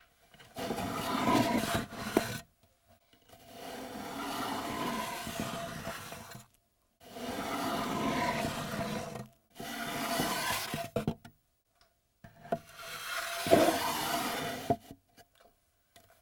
Wooden Box Drawer From The Inside Big Wooden Monster Breathing; london music mixing